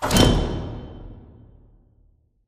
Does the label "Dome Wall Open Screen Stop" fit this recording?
Yes